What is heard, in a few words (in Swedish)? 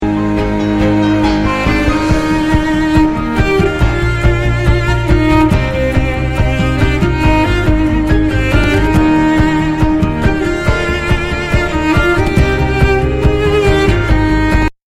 Fiol Och Piano; Frozen